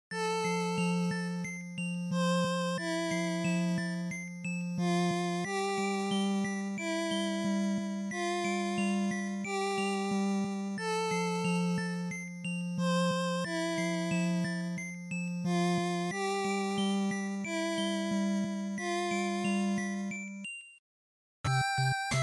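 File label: Game Boy Action Ending, bgm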